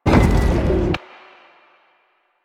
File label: Sliding Door Open; Warframe; Ambience; Nineteen Ninety Nine